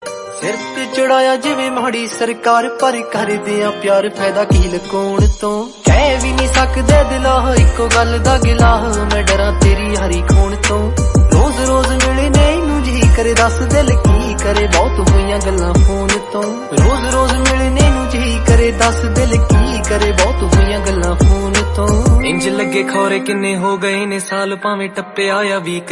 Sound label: Love Song Ringtone